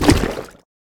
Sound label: Barf; smite; god bakasura